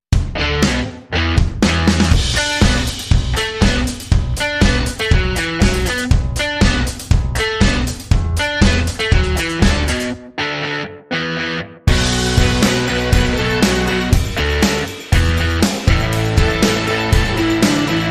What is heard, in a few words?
Funky Fuzz Rock